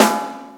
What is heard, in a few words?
ratter, snares real